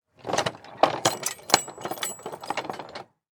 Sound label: Vehicle Supply Truck Lower Rear Gate Military